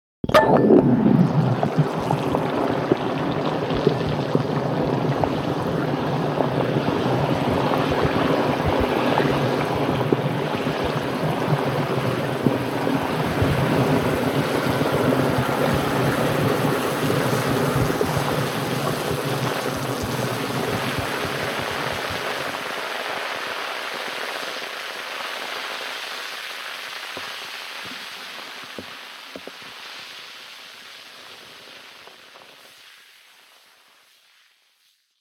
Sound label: Fizzy Tablet Underwater Bubble